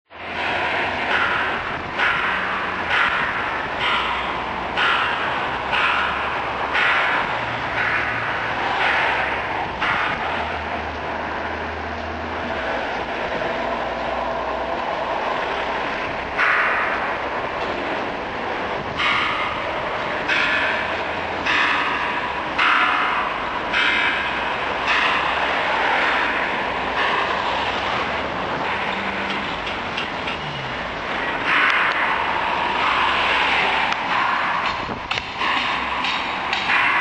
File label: hammering